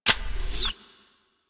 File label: Rail Jack Rifle Reload; Warframe; Tenno